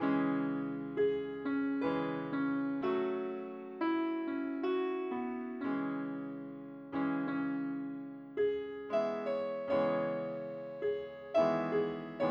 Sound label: piano